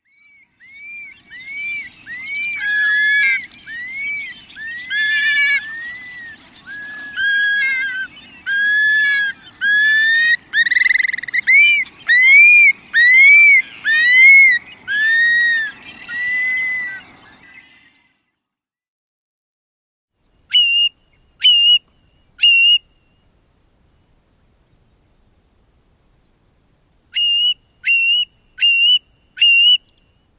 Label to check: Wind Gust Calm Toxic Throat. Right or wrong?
Wrong